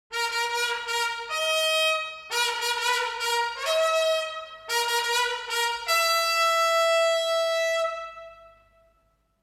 tattoo

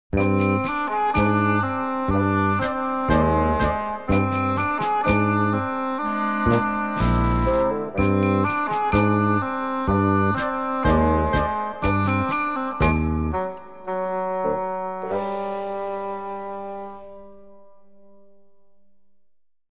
slapstick effects

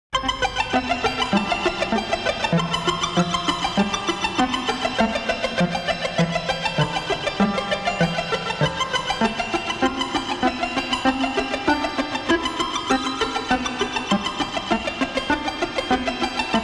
mechanical martenot

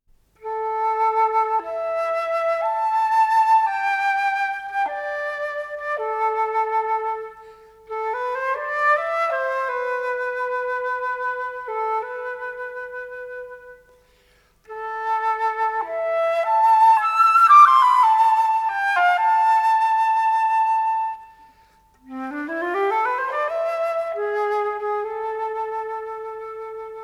shepherds pipe tune

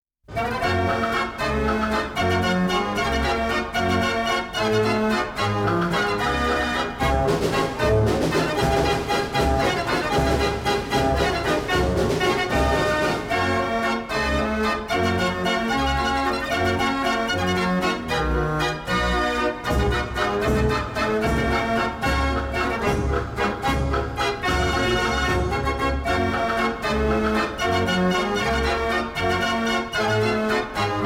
vienna waltz

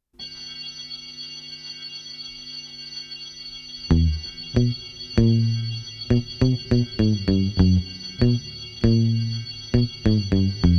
spooky

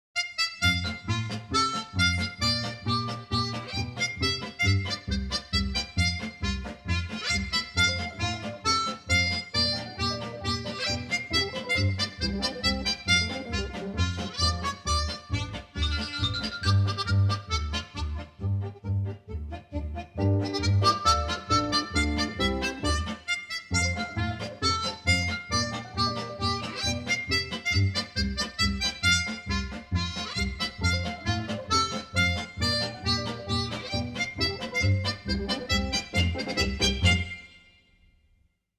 capstan hornpipe

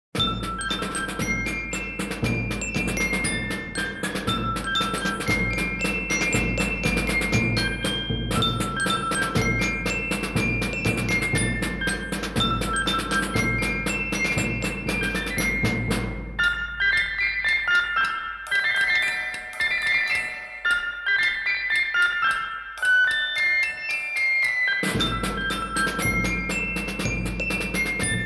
childrens band

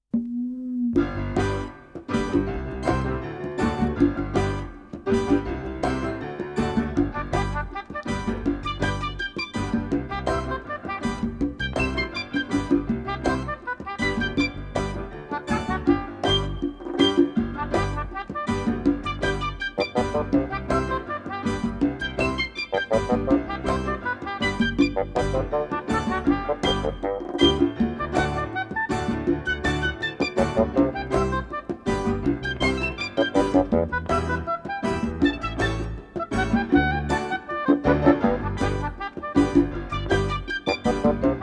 reggae

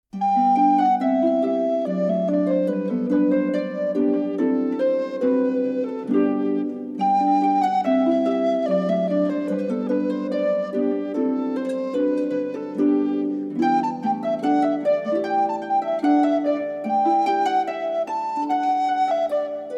english country garden

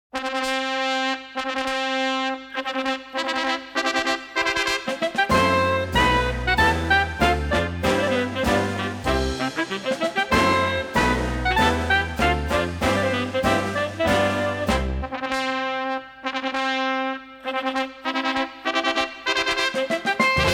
wedding march

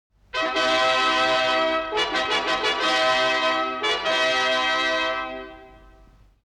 regal flourish

